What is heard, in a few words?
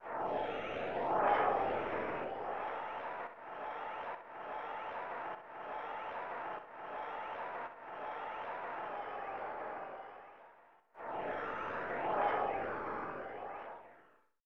Crowd; NBA All-Star Challenge; SNES